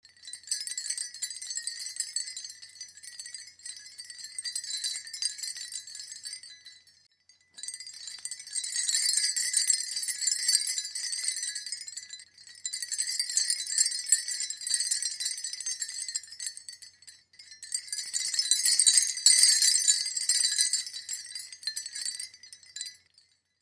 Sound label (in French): carillon verre